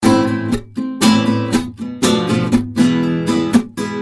latin guitar ringtone

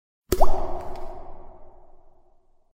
lovely water drop sound message tone

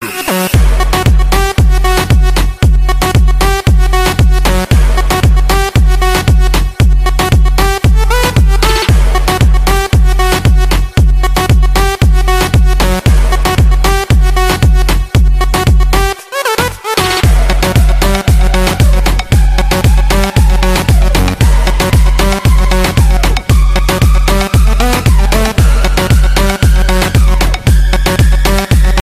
latest trance ringtone

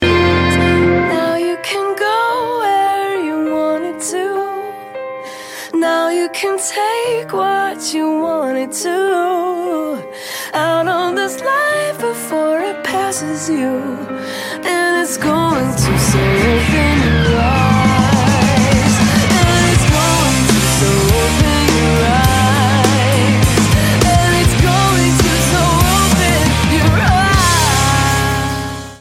message tone ringtone

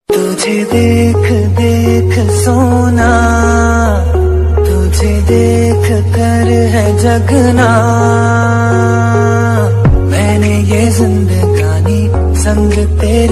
love song bollywood ringtone

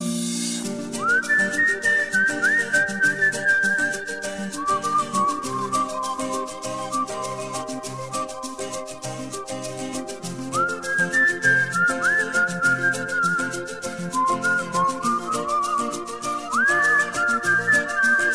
english ringtone

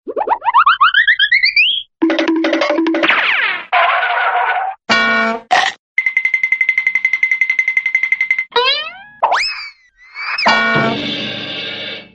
funny cartoon running background music

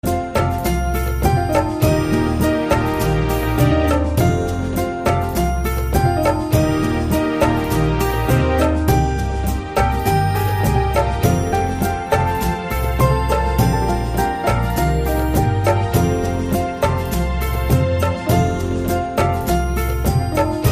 instrumental

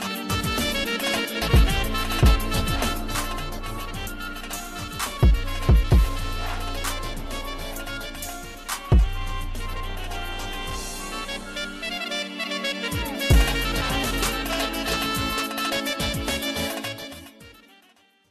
nokia sad remix ringtone

